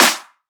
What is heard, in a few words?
Riddim Snare Clap